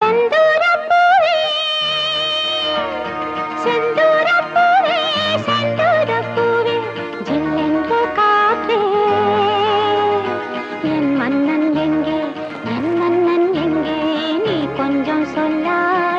bgm tamil instrumental; 16 Vayathinile; Kamal Haasan; Sridevi

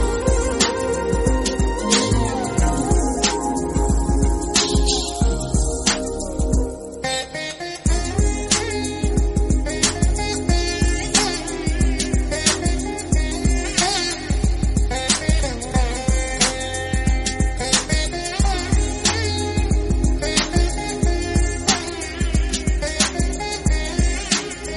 flute instrumental

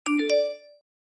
iphone 13 pro max notification tone

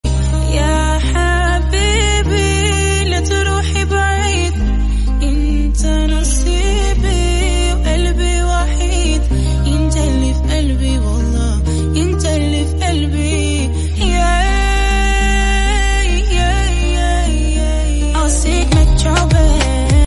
english song

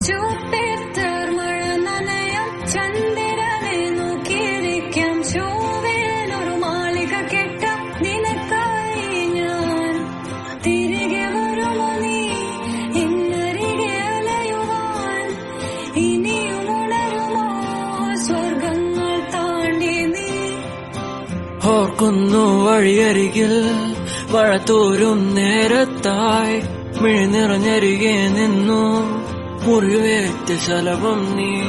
song

